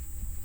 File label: Hydrophone